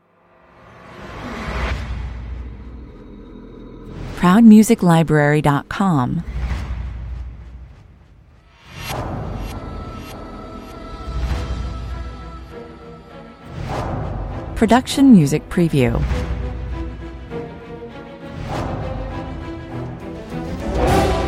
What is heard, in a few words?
with choir and solo vocal